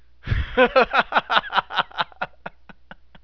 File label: laugh